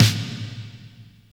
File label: snare HALL, Roland; Roland L-CD701; Snares 5; Sn Modules 5